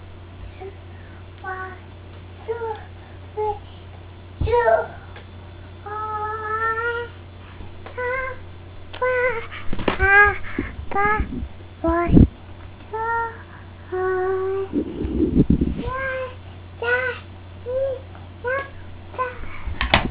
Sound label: mystery song